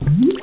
Water, 自然, 水声; 特酷声音素材库

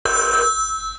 PHONE, 电话; 特酷声音素材库

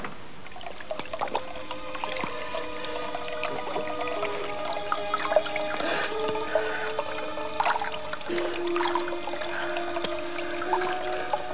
Water, 自然, 水声; 特酷声音素材库

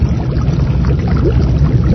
Boilingw; 暴力赛车

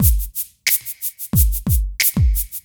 loop

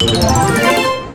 start; Microsoft Link-up

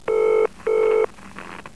PHONE, 电话; 特酷声音素材库

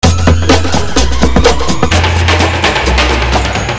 LOOP

